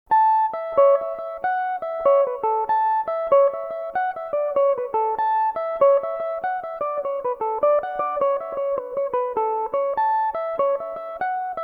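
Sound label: A mixolydian, irish mandolin, tunes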